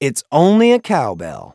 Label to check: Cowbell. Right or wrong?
Right